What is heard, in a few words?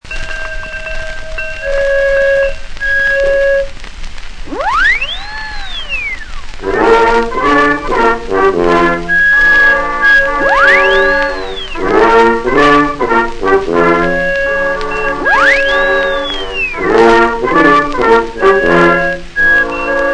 Orchestra, Ragtime; probably 1908; Arrow A-107